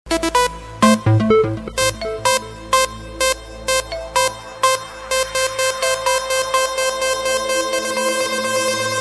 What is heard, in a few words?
Message Trance